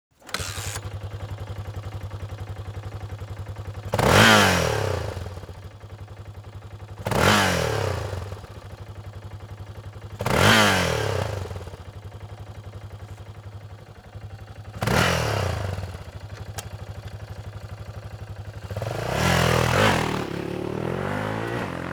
Yamaha TT 600 R, MC Lyde

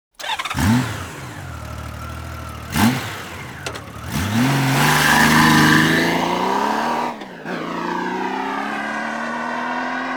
Honda GL 1400 Gold Wing

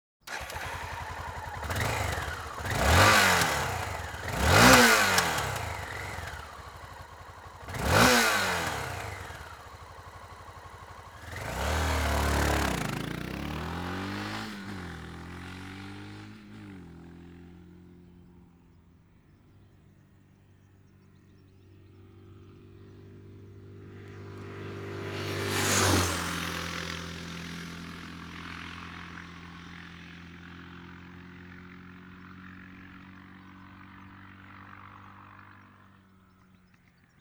Suzuki XF 650 Freewind

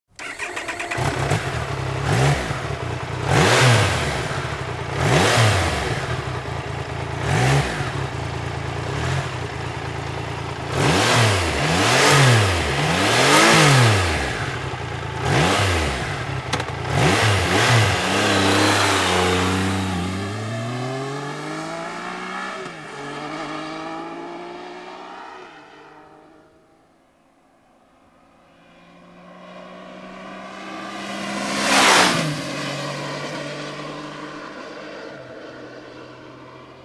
Triumph Tiger, MC Lyde

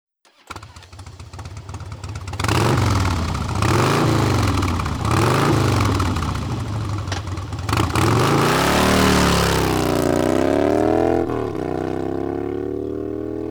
Harley Davidson Twin Cam 88, MC Lyde

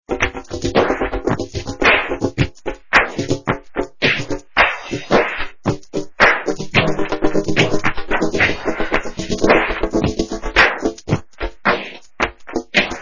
drum mess

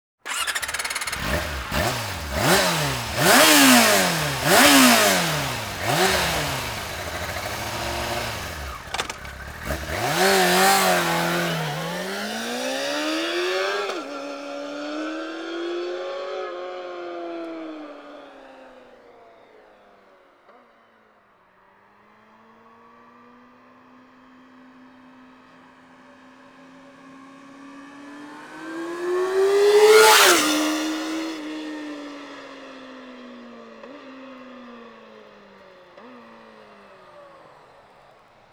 Yamaha YFZ R6

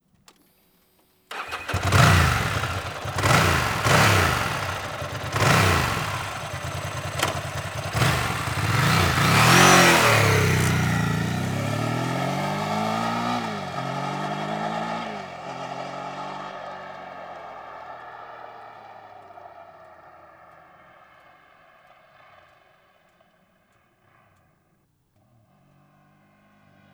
Ducati S4, MC Lyde

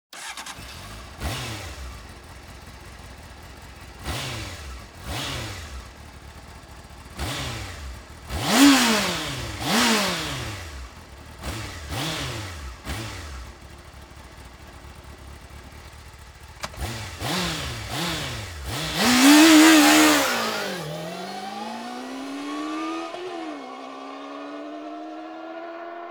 Honda CB 600 Hornet, MC Lyde

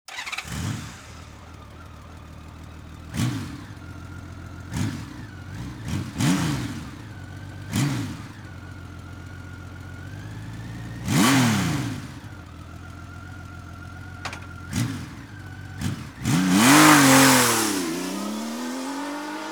Honda F 6 C Valkyrie

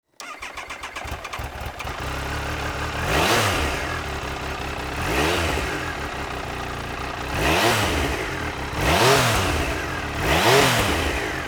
Triumph Daytona 955i, MC Lyde

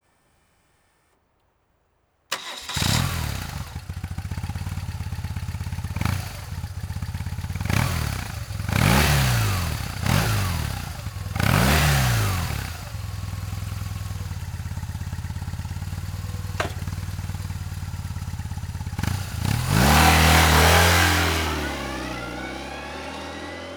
BMW RS 1200 C, MC Lyde

